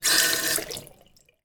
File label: household bathroom basin tap turn on very lightly and short